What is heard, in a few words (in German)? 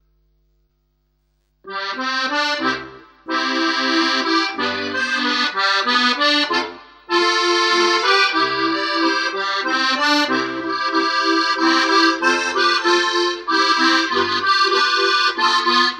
langsamer Walzer (1. u. 2. Stimme)